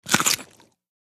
Sngl Shrt Pickle Bite

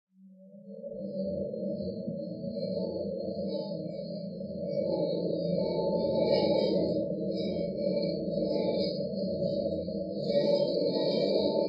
Alien Voices Synth; eerie ambience

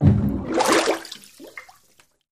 Bathtub Fart Boomi; farts

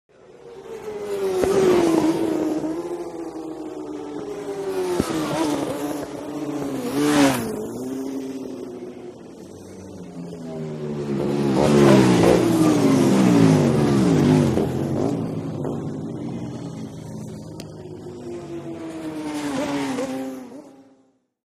Motorcycle Road Racing

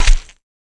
Corn Snap Low End Thum, gore stabs & eat

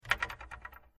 Antenna Oscillating

